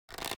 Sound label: Hail Of Arrows Bow; Ranger